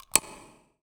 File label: menu on button